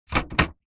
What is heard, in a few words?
Epson inkjet printer paper tray pull out